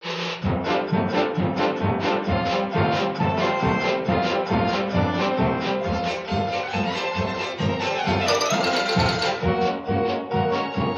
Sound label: wurlitzer